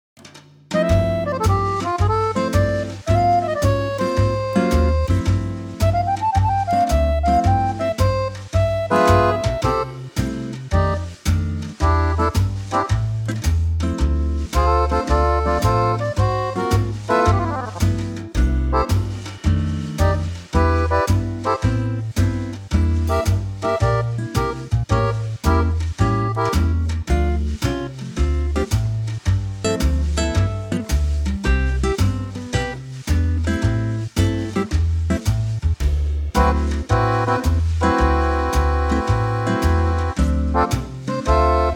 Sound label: C swing; key - C - vocal range - C to D (optional E); Fabulous Accordion Quartet arrangement